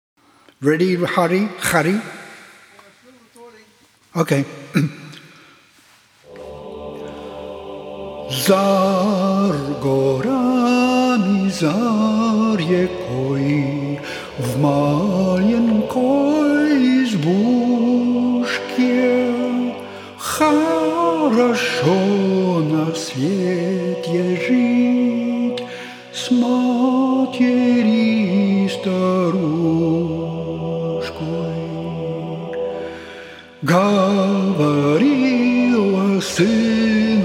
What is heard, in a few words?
Russian Folksong